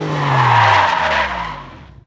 fekezes; Pure Pinball

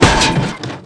karambol; Pure Pinball

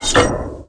Vagon Kapcsolodas; Pure Pinball

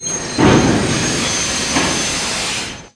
Mozdony Leallas; Pure Pinball

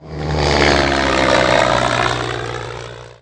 repulo; Pure Pinball

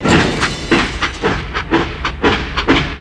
Mozdony; Pure Pinball

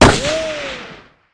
pisztoly; Pure Pinball

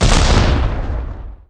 tank ágyú; Pure Pinball

